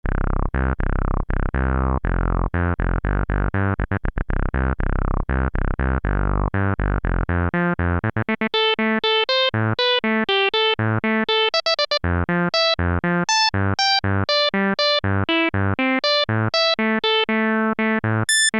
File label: e-mu morpheus filter demo lpf